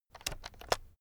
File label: keyboard external shortcut medium